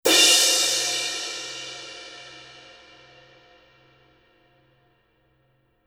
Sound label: 2002 black 20 big beat edge, paiste